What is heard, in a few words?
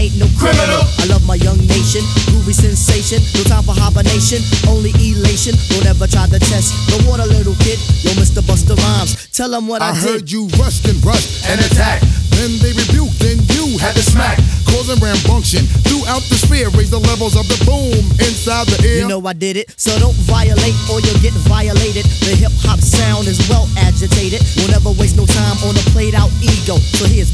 hiphop